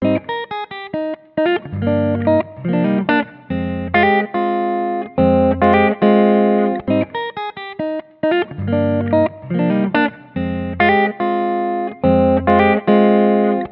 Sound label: guitar, Loops, Phonky